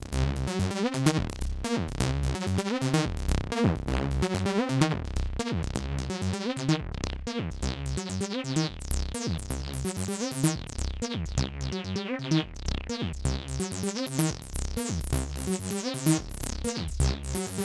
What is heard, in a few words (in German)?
roland tb-3 sequenz mit effekt und env mod